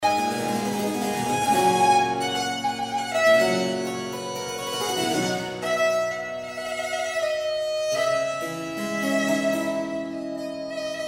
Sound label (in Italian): Sonata IV per il violino per sonar con due corde; Biagio Marini; NAWM